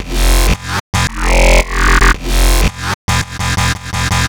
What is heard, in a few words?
F 112, Discostep Bass Loops; Destroyer